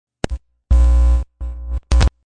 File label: Static Noise